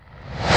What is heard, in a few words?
Alcove Slow Reverse